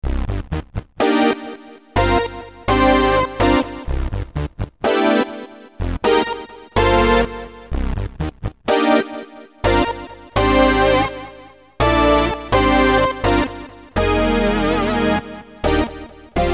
syn brass

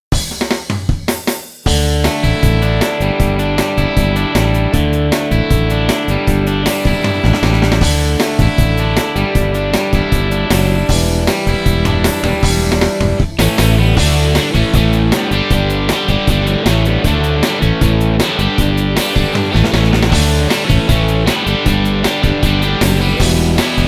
vo crunch

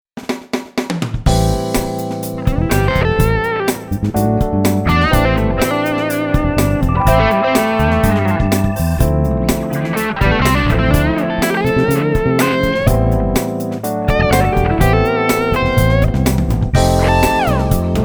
fusion lead

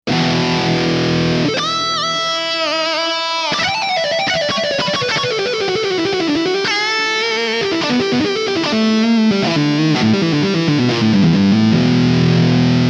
searing; juno-gi; cosm

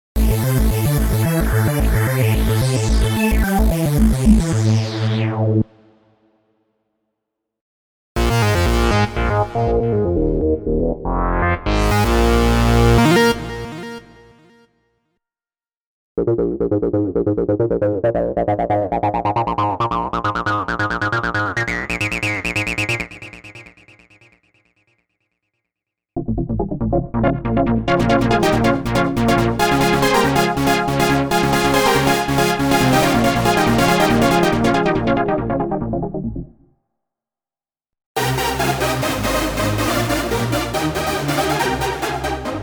techno trance electro; V-Synth GT v2

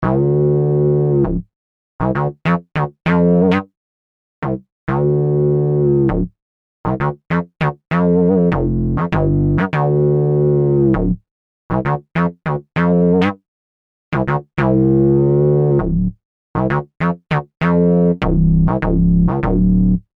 bass synth 303 bass

